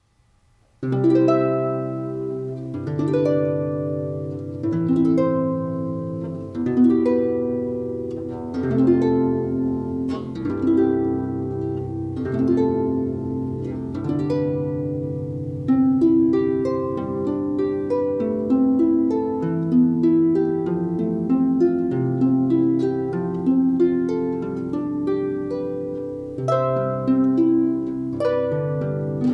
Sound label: Harp; Canon in D